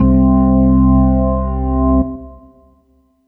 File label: Kawai K5000W Drawbar C2